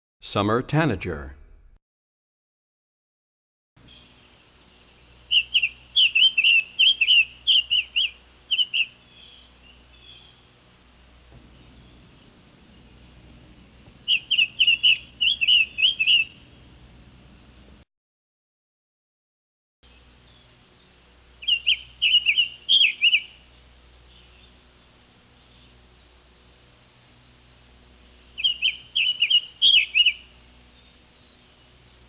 Summer Tanager, Birds; 101 Bird Songs

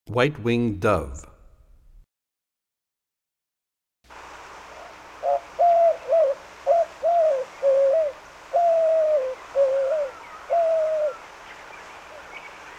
White Winged Dove, Birds; 101 Bird Songs

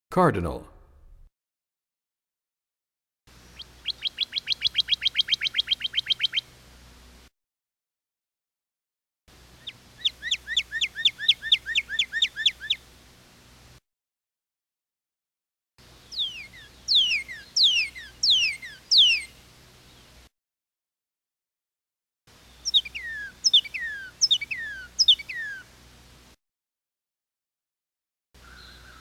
Cardinal, Bird Songs; 101 Bird Songs

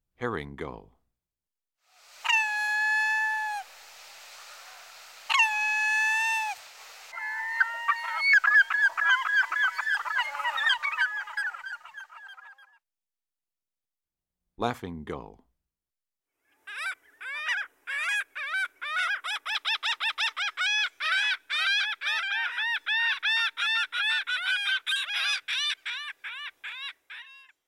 Herring Gull, Laughing Gull; Eastern-Central

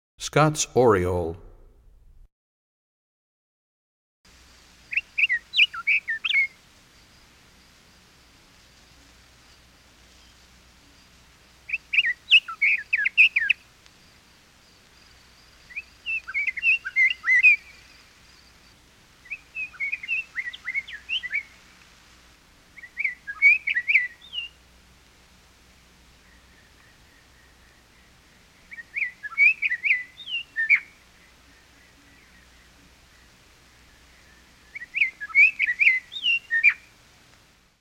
Scott's Oriole, Birds; 101BirdSongs

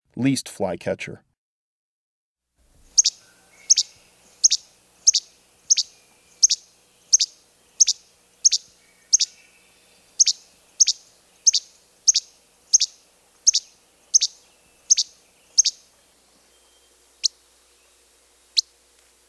Least Flycatcher

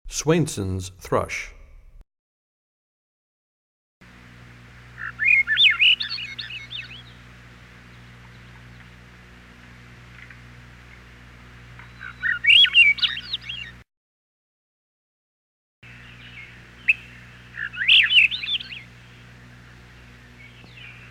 Swainson's Thrush, bird songs; 101BirdSongs

